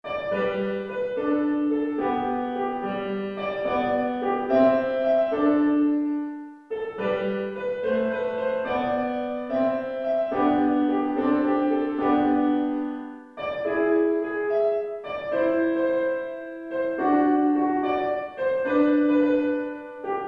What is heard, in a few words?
andantino; beruehmte klassiker